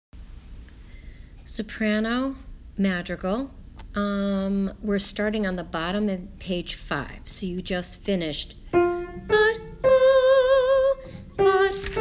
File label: Madrigal Sop